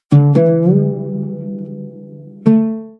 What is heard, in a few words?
oud splash